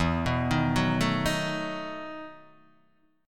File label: E Major 7th Suspended 2nd Suspended 4th E, Guitar, Open D